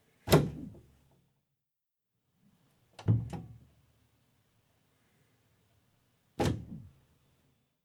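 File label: door open close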